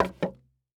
Foley Footstep Hard Sole Dress Shoe Single Step Down On Wood Boardwalk; Foley Footsteps